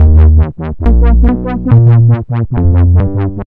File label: Bass